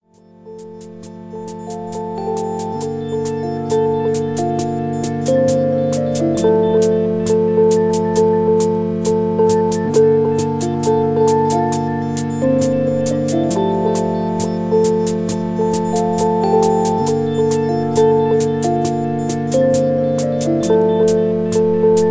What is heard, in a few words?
latin comes minimal